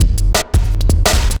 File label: Beat, Drum N Bass; Sonic Foundry (Sony Creative Software); Knutrix Drum & Bass